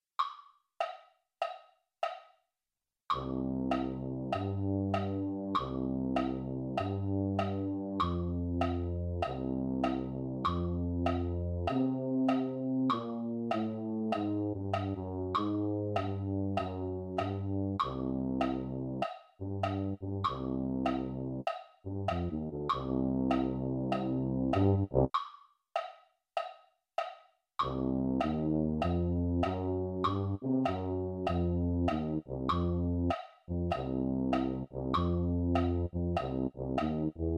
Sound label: tuba, MIDI; mini corps